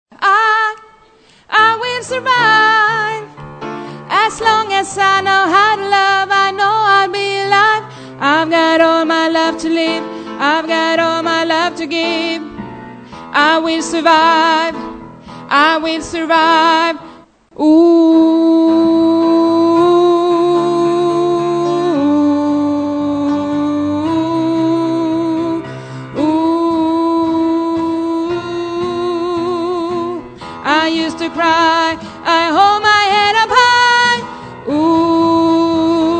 sopran, stammor

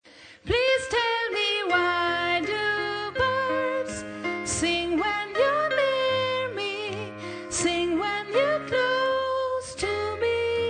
the day after tomorrow tenor, stammor

